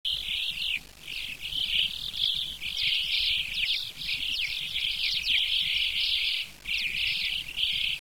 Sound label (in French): chocard